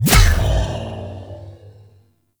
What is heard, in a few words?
Reverie Portal Appear; Warframe; Wisp